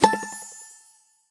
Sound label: Jingle Carotte